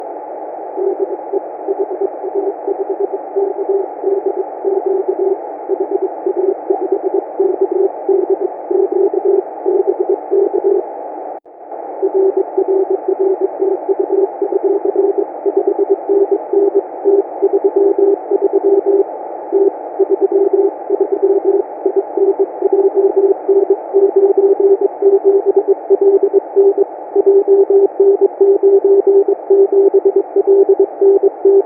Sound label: CW; HA5KDQ; 432